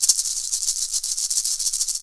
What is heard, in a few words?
PUILI, HAWAII PERC